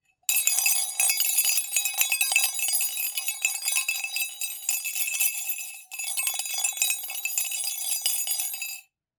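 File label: sound spoon in glass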